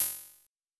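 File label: Hi-Hat Open, Luxor Passet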